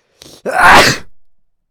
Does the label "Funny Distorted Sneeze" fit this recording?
Yes